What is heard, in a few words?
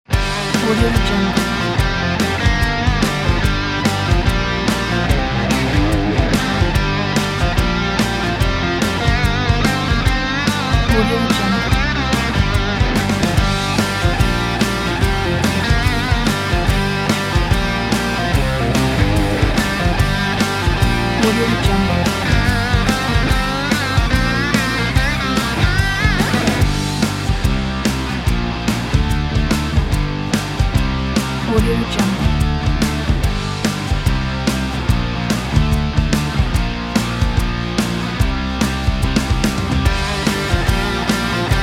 hard rock